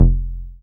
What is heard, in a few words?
303, TB-303 BASS